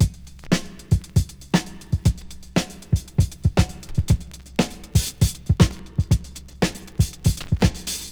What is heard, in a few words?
118 bpm drum beat g key, drum loop